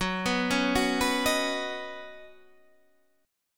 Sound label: F sharp Suspended 6th; arpeggiated